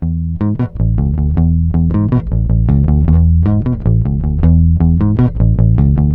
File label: Bass E; Flutes & Swingers; Zero-G Kocktail Kollection; Construction Kitz (Lounge); Flutes & Swingers 156